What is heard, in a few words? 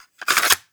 Remove Cassette